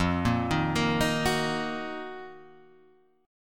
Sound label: Eb Minor F; Ebm/F arpeggiated; JGuitar